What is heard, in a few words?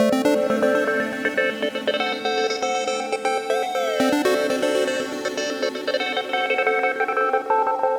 arp filter; arpeggio that has some long reverb on it (spacey!); Rhythm Quest